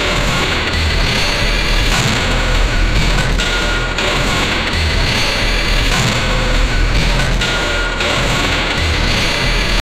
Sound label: heavy industrial rhythm